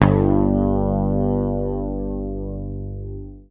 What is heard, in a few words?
PICK, Bass; Sampleheads; Will Lee Bass Library